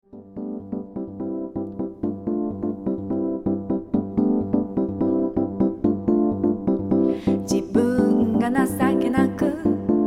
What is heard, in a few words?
Cosmic piano